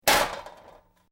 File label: Metal Debris Impact Short Medium; Small metal junk crash. Tools, pots & pans or metal parts small impact with rat; Debris Sound Effects